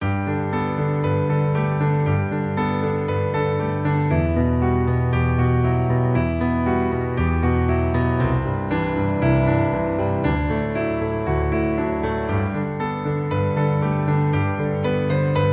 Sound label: Piano